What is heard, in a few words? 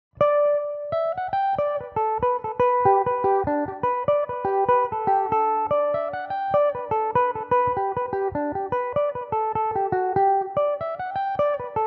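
jig G major belmuse, irish mandolin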